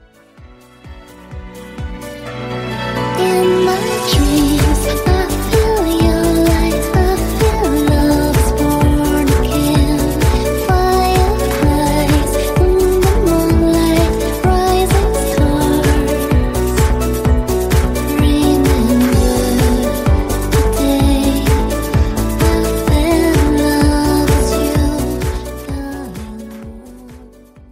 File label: Dance Tone